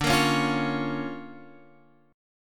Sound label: D Minor Major 7th Sharp 5th, D